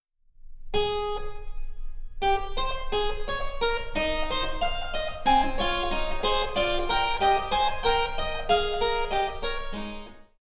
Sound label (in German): Cembalo; Mark Lindley WTC 1